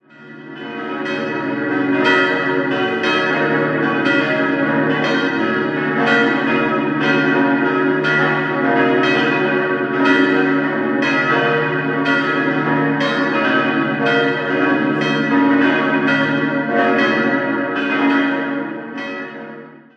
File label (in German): Goldach, Glocken